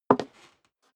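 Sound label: step high heels wood creak single left, footsteps; schritte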